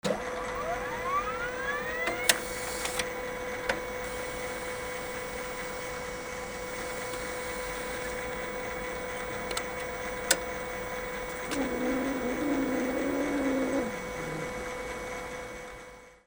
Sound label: OFFICE